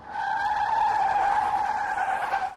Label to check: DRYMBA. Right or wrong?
Wrong